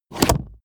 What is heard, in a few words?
plastic latch wobble